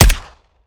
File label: sup plr; weapons; gmod; viper; scharlie